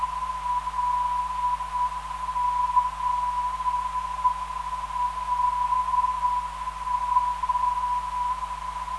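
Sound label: 348.5kHz, non-directional beacon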